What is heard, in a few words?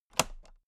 toolbox latch close heavy